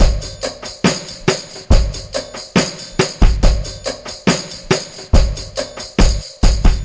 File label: Packet, downtempo beats